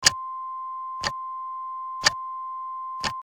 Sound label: clock